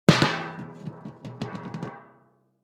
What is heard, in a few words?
jumbo popcorn pop; SFX; DailySounds